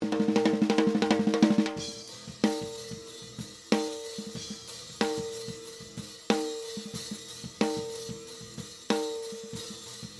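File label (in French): snare, le micro Caisse-Claire dessus seul (le charlet passe assez fort dedans); batterie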